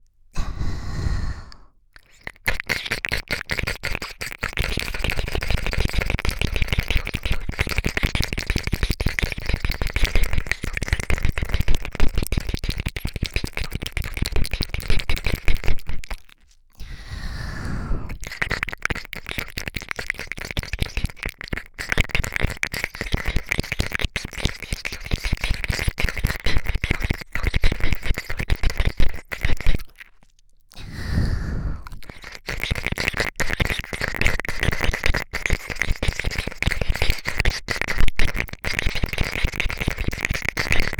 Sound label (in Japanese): 吸い込み舐めコース